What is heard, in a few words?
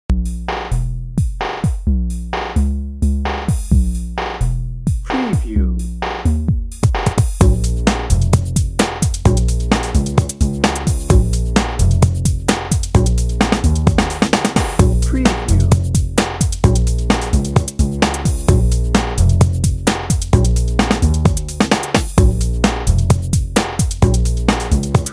party set dnb